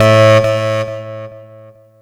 saw lead synth

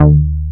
D3, J-106 BS; Drums In Your FACE!

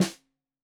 Snare 65 Reg Stereo, Deeply Sampled Drums

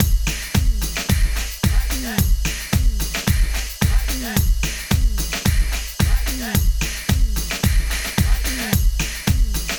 POP; Funky Xtreams; INIS

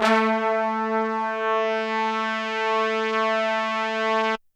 HORNS A2 R

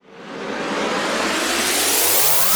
Sweeps Up, Effects

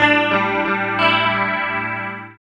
GUIT; East West - Phat & Phunky

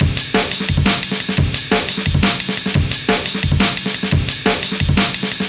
Amen EQ 175, Classic Remastered Jungle Breaks

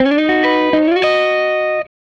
Gretsch Guitar Loop; Sonic Foundry (Sony Creative Software) - Six-String Orchestra

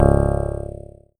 C#, PIANO; Big Fish Audio; Synclav FM

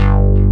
sharp bass; Pro-Rec - Synthsonic; BASSES

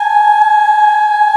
G#4 child ah, choir; USB Soundscan vol.28; Acoustic & Synth; AKAI; CHILD AHF

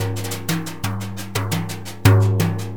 Synclavier Percussion, World & Orchestral; ILIO; Synclavier Percussion 2

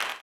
CLAP, blues & soul; ILIO; Vocal Planet

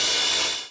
DOWN, DOWN KIT 78

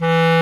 Clarinet & Bass Clarinet

